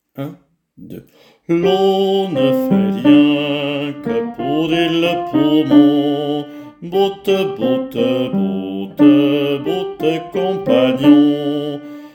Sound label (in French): chanson a boire refrain bassi; qui veut chasser une migraine